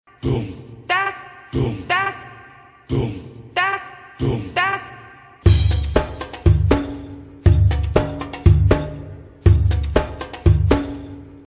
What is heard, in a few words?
conga; rhythm CD for teaching